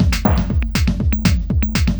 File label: Drum